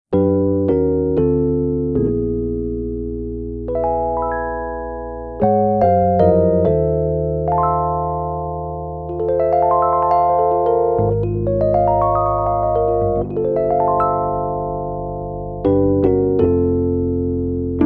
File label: sparkle, fender rhodes sparkletop